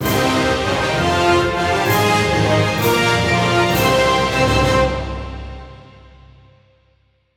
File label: Battle Cleared Midboss Melody; mario rabbids kingdom battle complete video game soundtrack